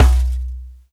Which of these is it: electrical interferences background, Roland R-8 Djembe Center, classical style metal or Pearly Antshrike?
Roland R-8 Djembe Center